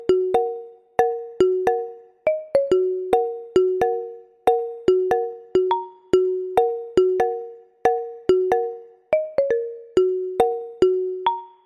Original Iphone Marimba Ringtone

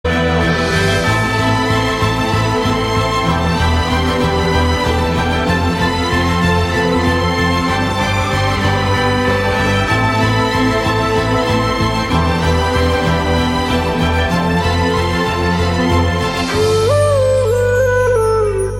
Flute Bgm; David

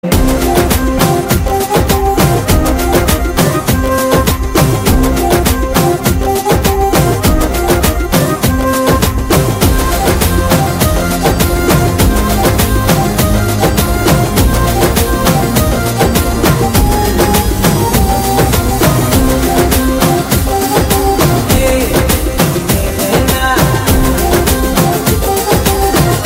Drum Beats BGM

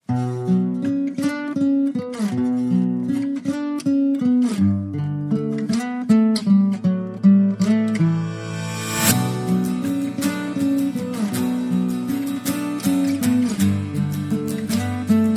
Instrumental

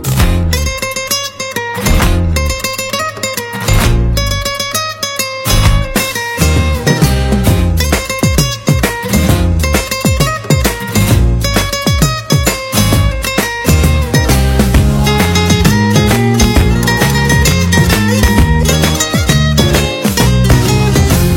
Guitar Tone

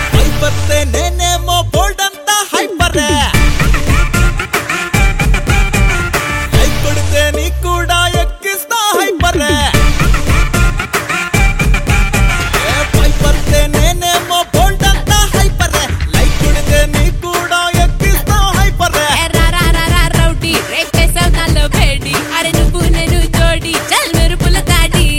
Male Ringtone

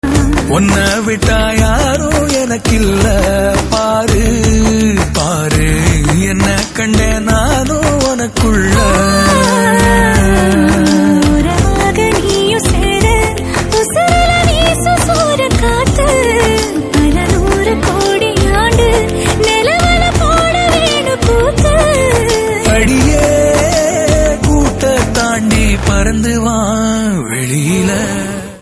Male Version

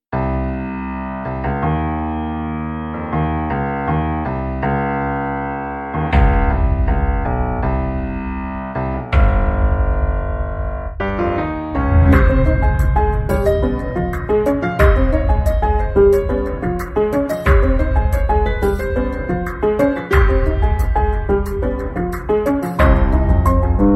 Piano BGM; Ratsasan

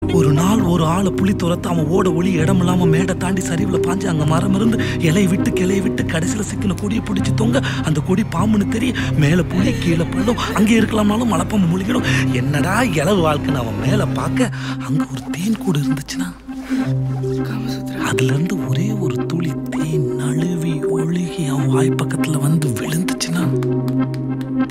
Trailer Ringtone; Super Deluxe

